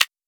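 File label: button; Mutually Assured Destruction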